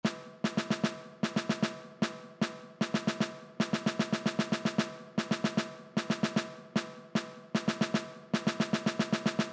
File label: bolero snare